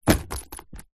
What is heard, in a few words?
shoes leather business womans throw down drop carpet; foley